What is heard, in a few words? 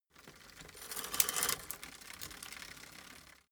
bicycle street upshift